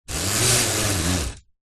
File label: household blind shade pull cord room slight distance; zapsplat